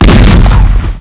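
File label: double barrel, weapons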